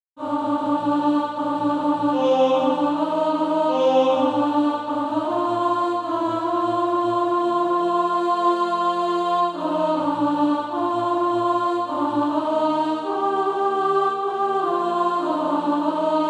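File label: alto, Practise Music; Forever with Jesus There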